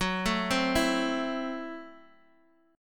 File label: Gb Minor 7th Flat 5th, Guitar Open G